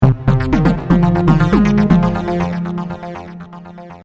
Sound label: synth fanfare; Battle Theme